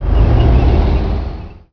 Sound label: camouflage apc motor; Emperor - Battle for Dune